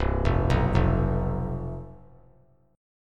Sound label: C Major 7th Suspended 4th, down; arpeggiated